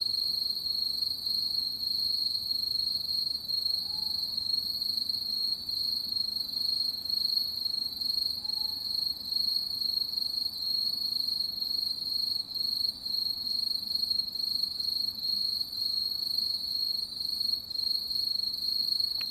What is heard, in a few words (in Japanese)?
aomatumusi